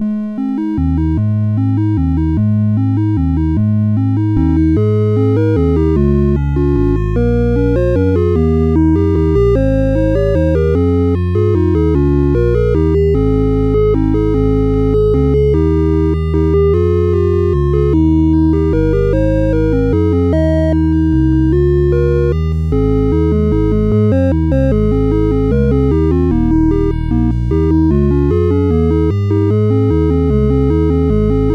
Computer Christmas Card sid CSG8580R5; DEMOS

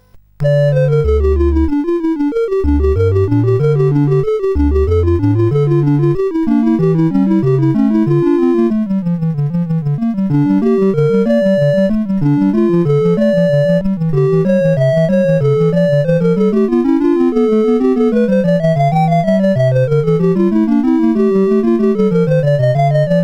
Rocket Ball, CSG8580R5; GAMES

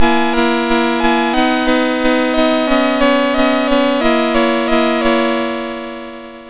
sid MOS6581R2; GAMES; Plaque Man

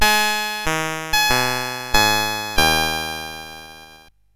sid CSG8580R5; Metro-Cross

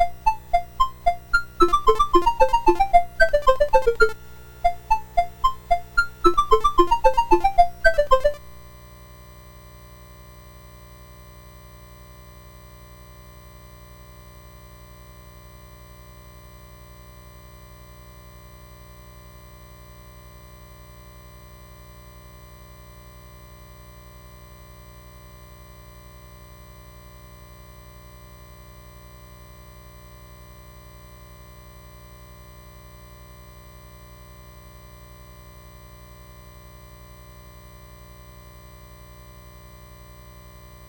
Two-Part Invention no 8 in F, sid MOS6581R2

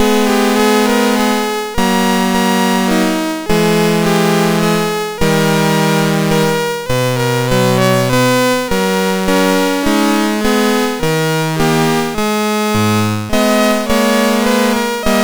Digi Hits, MOS6581R4

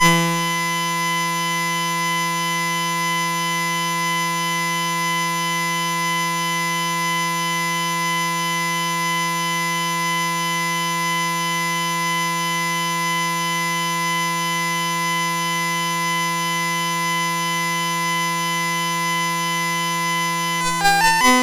sid CSG8580R5; Bird Mother

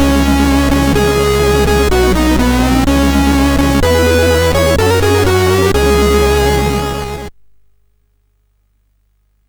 Jingles, sid, CSG8580R5; Supremacy

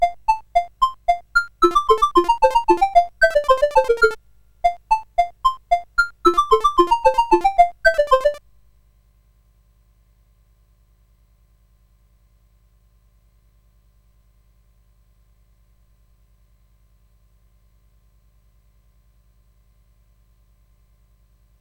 Two-Part Invention no 8 in F, sid CSG8580R5

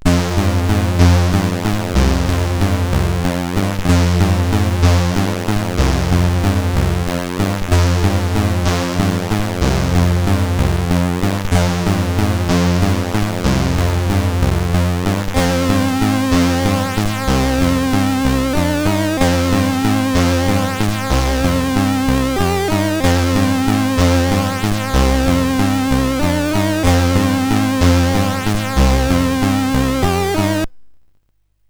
sid CSG8580R5; Taevlings Bidrag 3

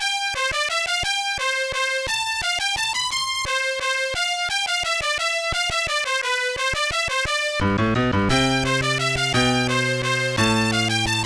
Classical song

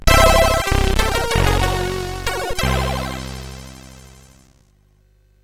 sid, CSG8580R5

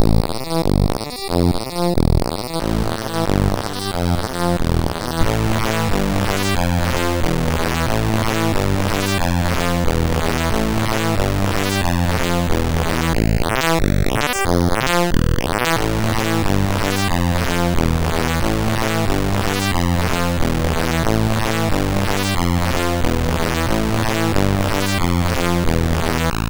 sid, MOS6581R2; Zzap69; Lingo

